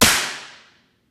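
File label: physics clipboard impact large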